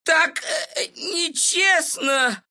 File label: death, ru; Meepo; dota2